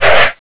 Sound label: squeal